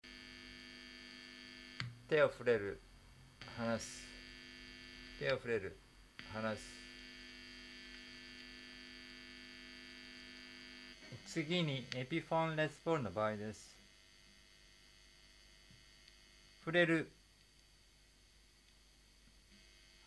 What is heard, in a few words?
noise Ibanez RGT220 Epiphone Les Paul